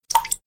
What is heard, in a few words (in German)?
Wassertropfen Klingelton